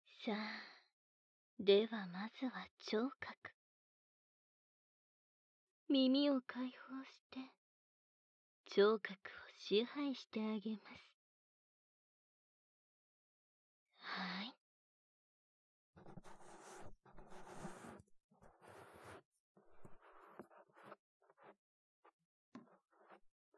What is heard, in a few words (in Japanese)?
ゆるい耳舐めと囁きと激しい耳犯し; 聴覚支配